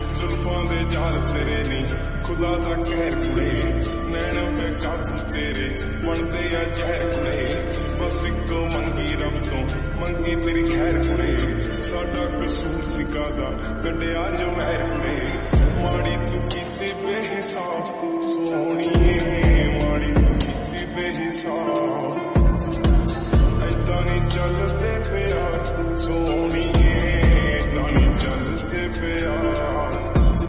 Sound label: Slowed Reverb Punjabi Song Ringtone